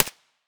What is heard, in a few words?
mp5 silencer mono distant